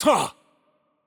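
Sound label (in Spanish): Hurt Light, Mexican Spanish; es MX; VO; bloom minigame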